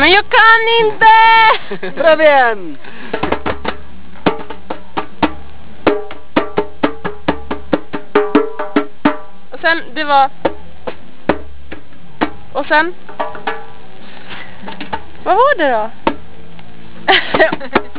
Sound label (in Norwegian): Tromme; TexMex